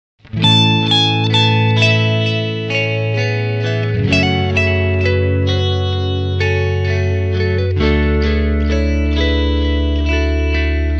relaxing guitar; Electronica

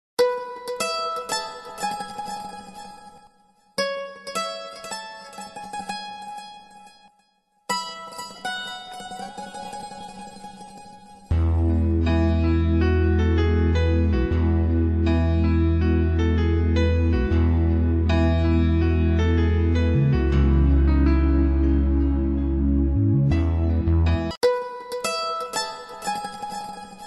guitar strings; Latin

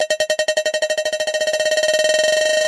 short sound ring

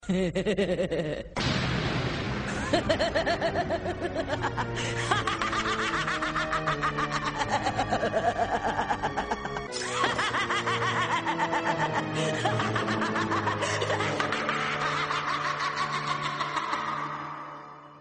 laugh; Baku

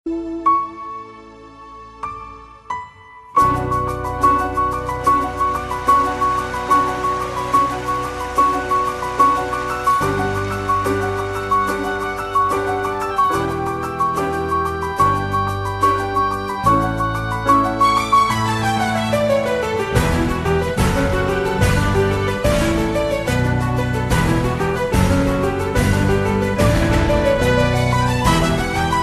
ringtone

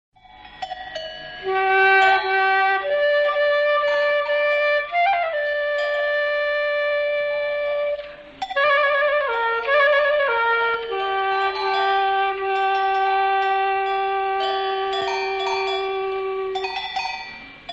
jingle; EPT

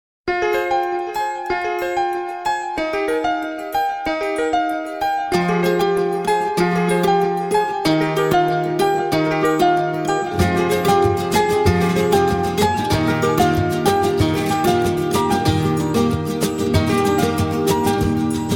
gipsy guitar; Latin

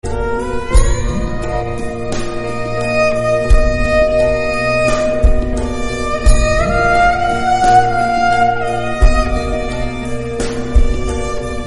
sarangi, soul

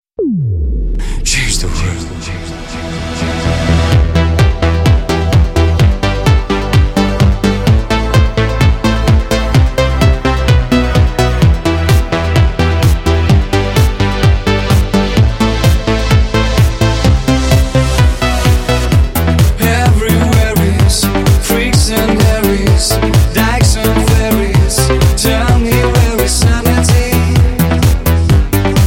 house ring

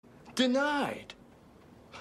denied

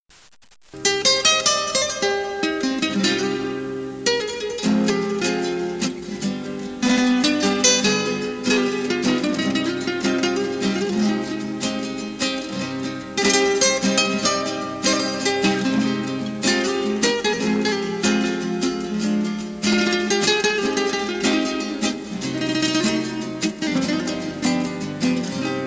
spanish guitar nice; Latin

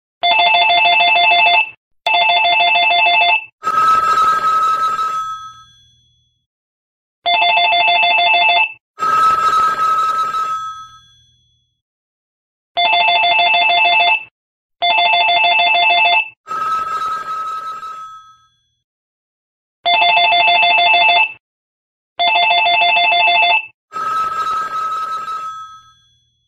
cell sound galaxy s5

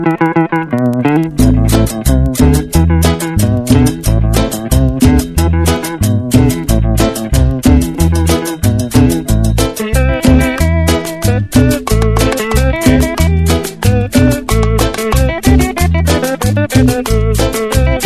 cumbia; Latin

